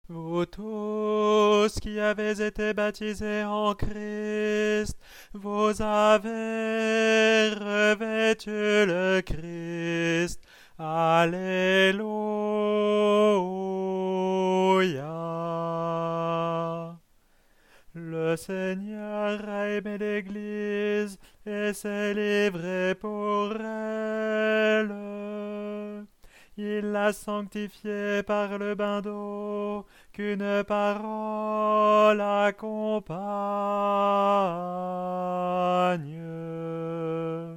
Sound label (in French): Soprane; Vous tous qui avez été baptisés; attention, pas sur le même ton que la partition et les autres enregistrements